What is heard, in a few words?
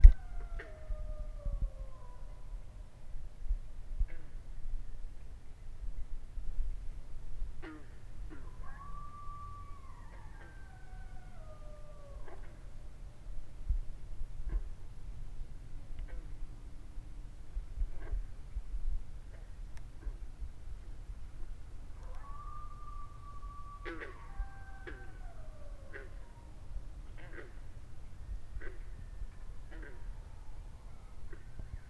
coyotes